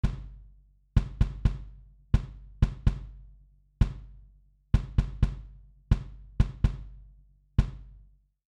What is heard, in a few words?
Kick Trans Proc